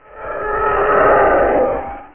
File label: tie fighter flyby